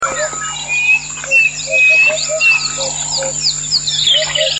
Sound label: klaass cuckoo